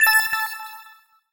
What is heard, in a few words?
Submission, notification